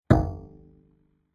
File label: Single Dramatic Hit, impact; Miscellaneous Impact Sound Effects; Andrew Fleming